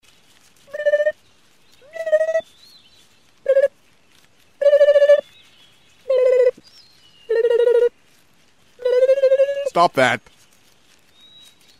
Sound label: unicorn tongue